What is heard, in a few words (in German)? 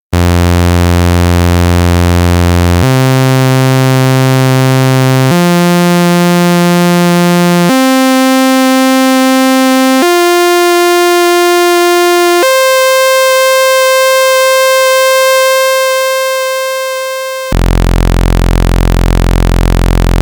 Sägezahn